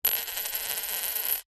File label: money australian 5 cent coin drop on tiled table and spin; foley